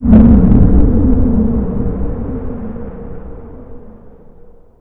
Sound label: nature hell; theodyssey